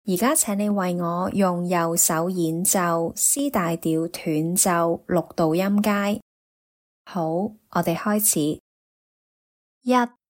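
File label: C major staccato scale in 6ths right hand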